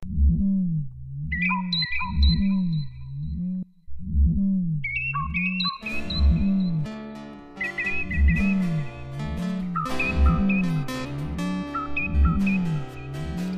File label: curlew song